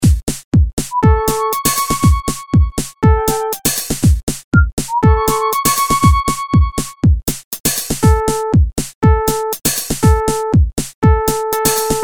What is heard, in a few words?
flut; csound